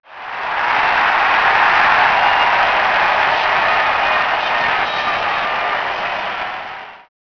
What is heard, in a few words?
cheering